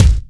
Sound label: smooth kick a key